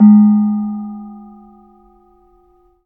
KENONG; Spectrasonics; Heart of Asia

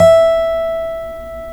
folk steel, guitars; Spectrasonics; Hans Zimmer Guitars VOL-1

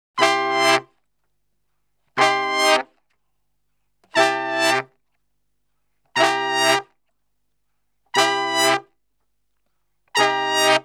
Salsa Picante; Masterbits; World Zone VOL-3

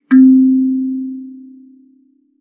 KALIMBA C4; Xsample VOL-7

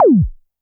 korg trident

